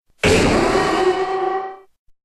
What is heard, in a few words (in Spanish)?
Grito de Mega-Houndoom